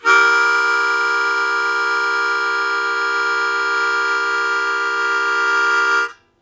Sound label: Blues Chord Attack C1, Harmonica; 90 s Sample CDs; Zero G